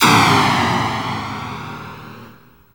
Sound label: Steam